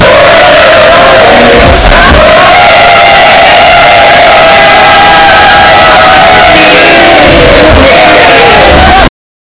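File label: boys and girls are chanting, concert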